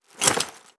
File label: warrior walk foley